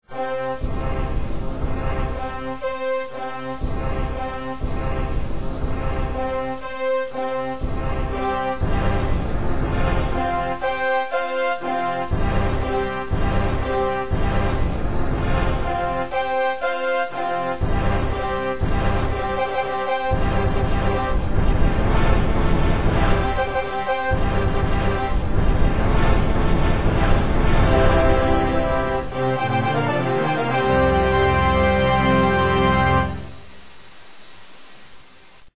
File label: Fanfare